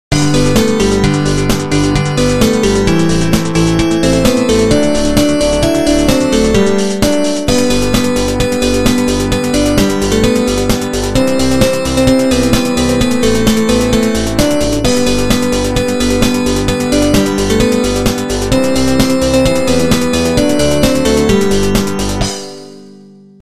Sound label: Jingle Bells